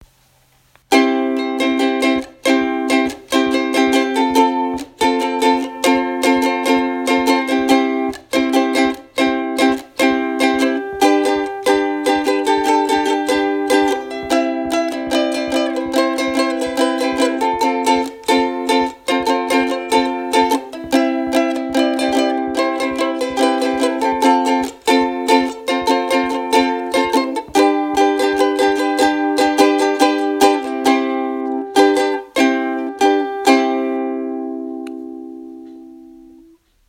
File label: uku